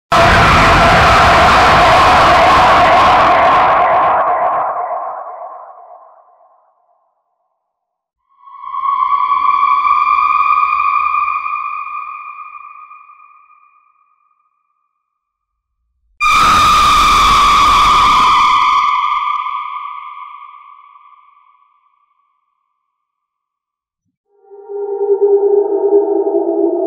horror scary sound suoni spaventosi e inquietanti effetti sonori